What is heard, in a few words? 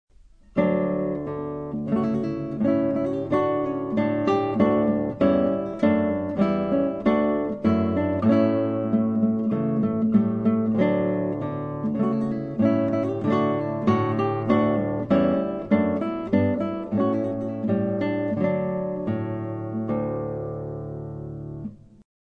Trumpet Voluntary Solo